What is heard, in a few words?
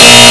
strike